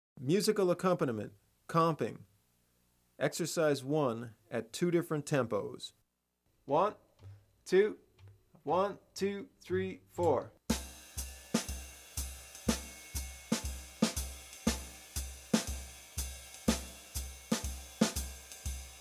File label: Comp Ex. Slow, Bop Drumming; The Art Of Bop Drumming; Riley